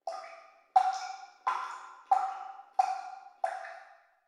Room Shower Fauc Drip; Prison Architect

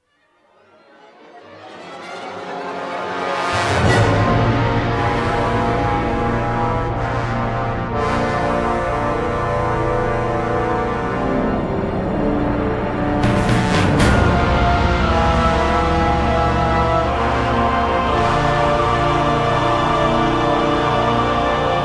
Main Menu Music; mad global thermonuclear warfare

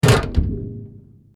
Silly Trap Door; F.A.M.P.S

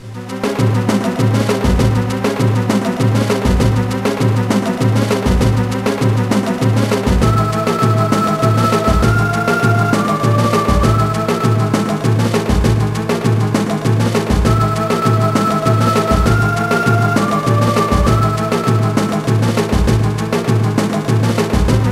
Boss Battle

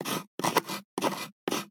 Scratch Insignia OUT; PA DLC GANGS ScratchInsignia

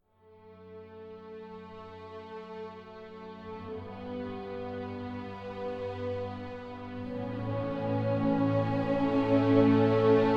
emotional film score; Hell Warders

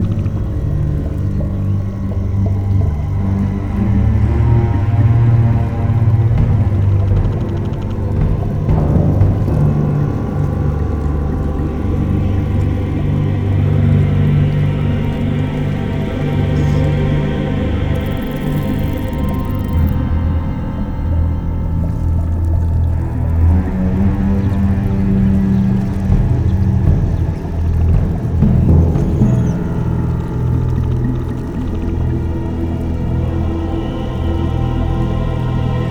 Swamp Ambient; Level Swamp Sfx; Dungeon Hunter 2; android ios mobile gamerip 2010

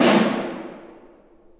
Snare; Torchlight III; PS4

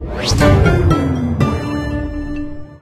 Battle Lost; Talking Tom Bubble Shooter

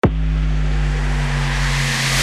Bgm Contemplative Intro; Slave Zero X; windows gamerip 2023

